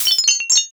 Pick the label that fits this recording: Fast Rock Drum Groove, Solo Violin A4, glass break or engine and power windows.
glass break